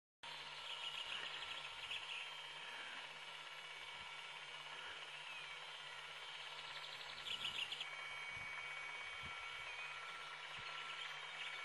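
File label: honey buzzard calls; allen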